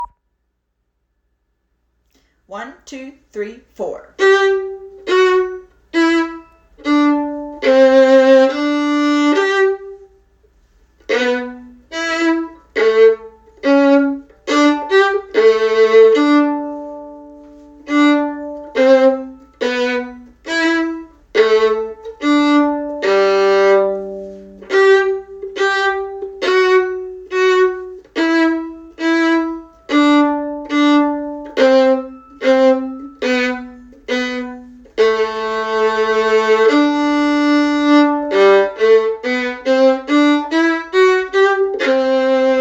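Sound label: violin 2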